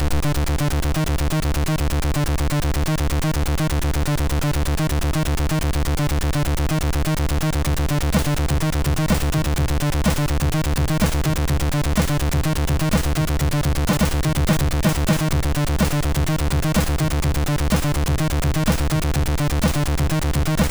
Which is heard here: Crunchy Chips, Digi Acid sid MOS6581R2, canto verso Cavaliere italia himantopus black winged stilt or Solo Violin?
Digi Acid sid MOS6581R2